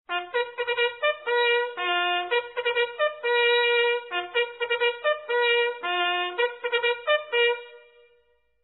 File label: 1st dinner call; Recruits